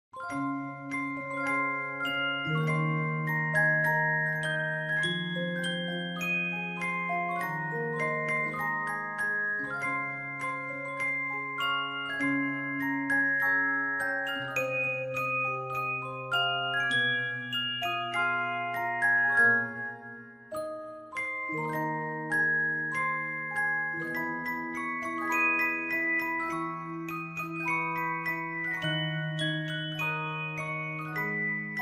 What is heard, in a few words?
music box ver.